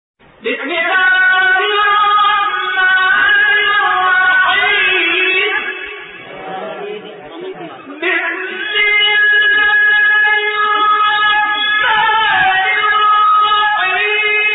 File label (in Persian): تلاوت سوره مبارکه; نجم; شعبان عبدالعزیز صیاد